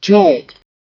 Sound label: countdown ding